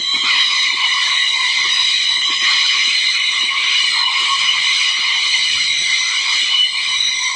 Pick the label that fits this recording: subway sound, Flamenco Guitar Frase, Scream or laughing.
subway sound